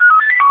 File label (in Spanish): emisora policial, radio effect